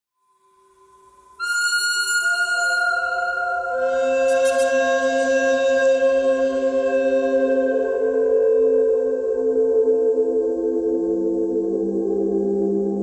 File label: bird theme bird cry